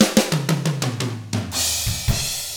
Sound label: 116 SHUFFLER, Drums